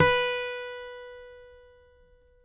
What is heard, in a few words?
ACGT F B4, ACCGTR PICK, Country